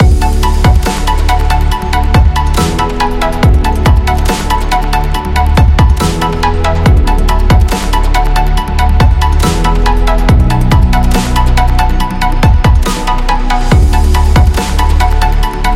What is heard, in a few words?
Technology Loop Corporate